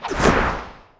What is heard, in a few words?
reveal; bonnybetty